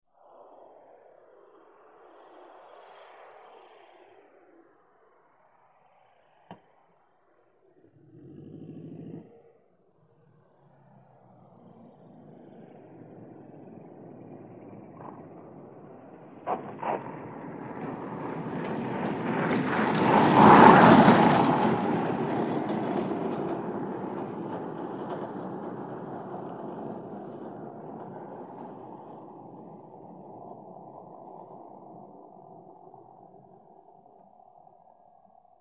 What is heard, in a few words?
Throttle, Grinding And Impact As Wheels Touch Down, By With Metallic Rattles On Dirt Surface. Stops In Distance, Engine Grinds To A Stop. Distant